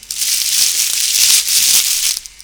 paper rustling

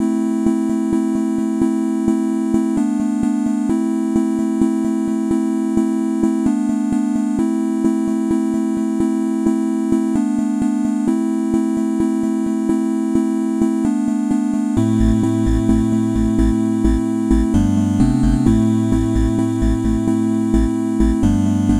The Organ NES

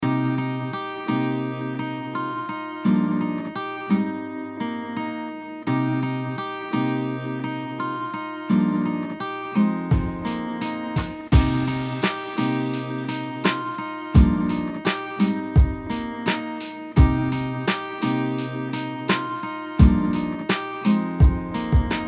Countryside

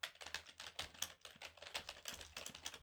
keyboard typing mash

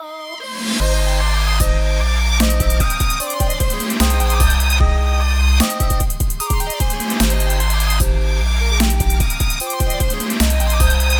level complete

